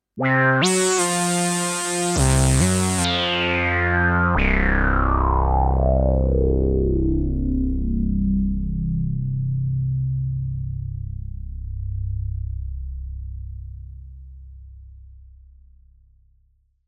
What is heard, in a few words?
Monopoly Solo Sound